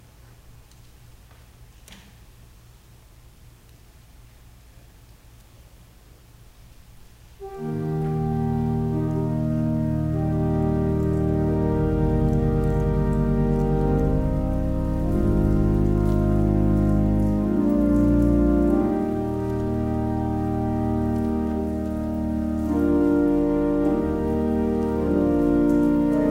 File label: Organ Music